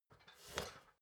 step ladder metal scrape single

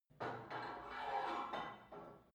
put weight onto barbell reverby; sport freizeit; fitnessstudio

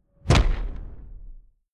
rising wood smack; whooshes rises

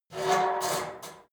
metal squeal saturated short

